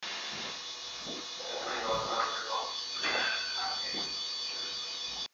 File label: breath